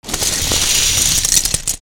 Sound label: Lego Tail, destructibles